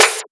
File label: Claps; Vengeance - Essential Clubsounds Vol.5